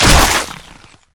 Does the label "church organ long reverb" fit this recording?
No